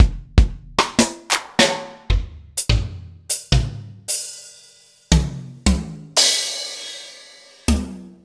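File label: Roland MV8800 drum POWER KIT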